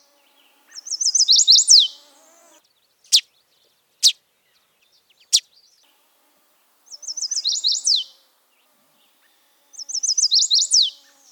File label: Chestnut sided Warbler